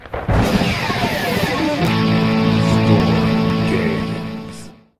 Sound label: game intro; numnastics